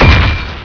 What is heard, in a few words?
EXP MET; CHASM